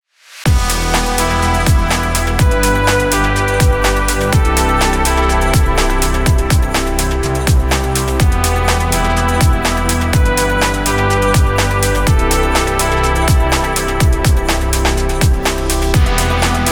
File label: futuristic technology dreams